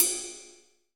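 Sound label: CYM RIDE, ride cymbal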